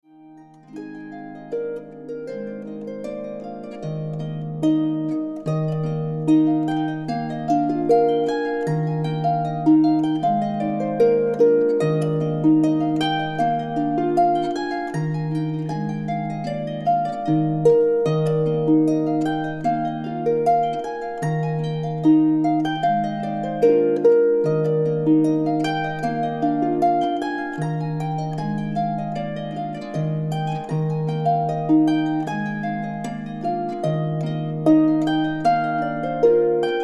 Sommerkönigs Marsch

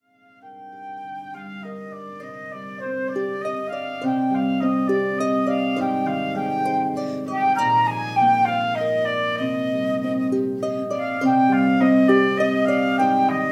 Harfe und Flöte

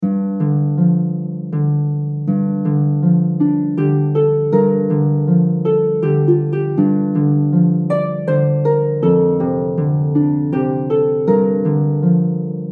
Harfe; Sussex Carol

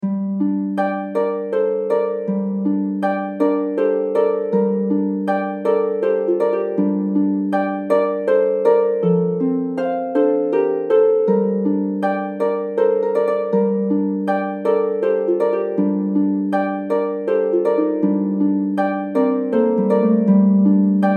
Harfe und Melodie; Sussex Carol

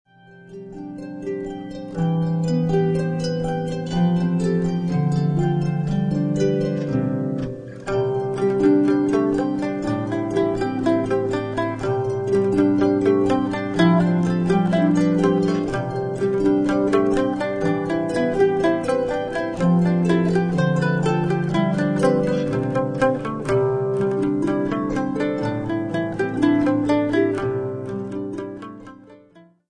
Mandoline

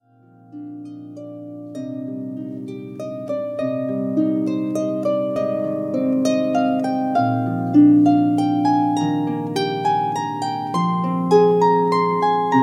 Herbstwalzer Harfe

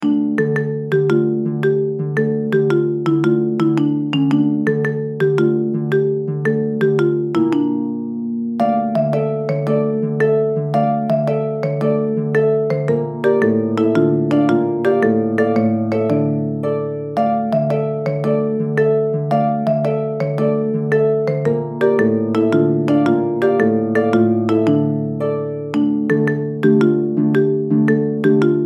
Masters in this Hall Harfe und Melodie

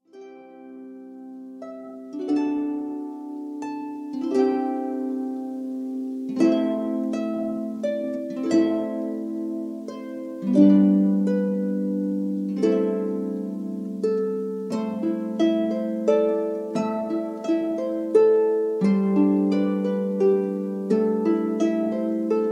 Harfe; O Heiland

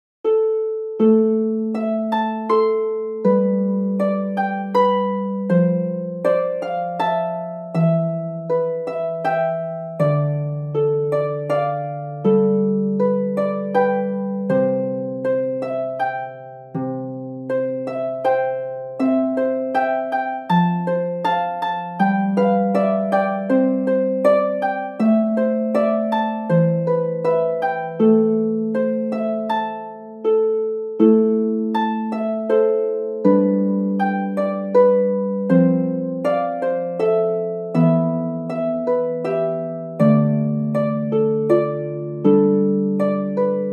Harfe und Melodie; Es kommt ein Schiff geladen

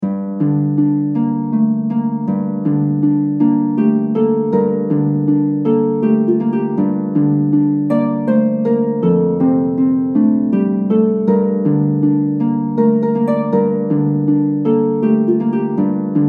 Harfe und Melodie; Sussex Carol

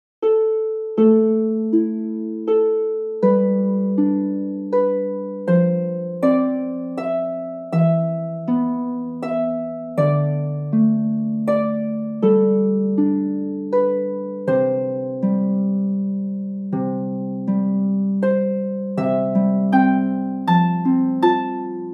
Harfe; Es kommt ein Schiff geladen

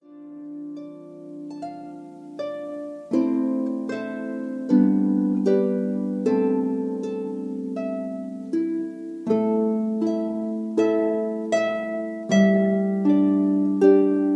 O Tannenbaum Harfe

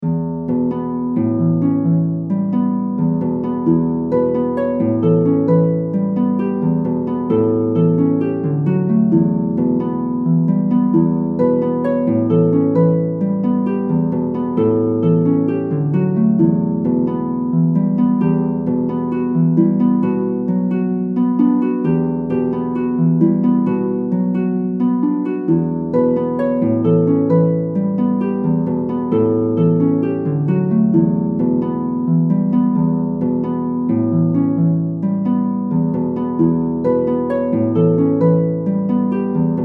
Harfe; Noel Nouvelet

